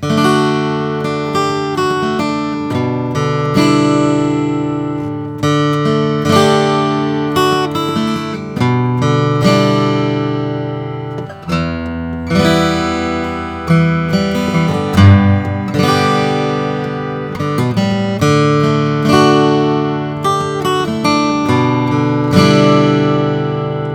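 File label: macassar ebony grand natural, guitars; goodall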